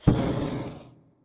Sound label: Roaster flame start